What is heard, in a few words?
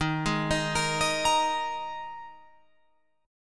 D sharp Suspended 4th, Guitar, Open F; 15,15,15,17,18,17; arpeggiated